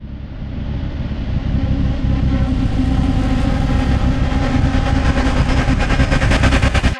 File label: knife struggle tension; one finger death punch 2